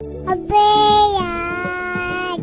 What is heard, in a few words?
Phone Trending Message Tone; Vivo; Abe Yaar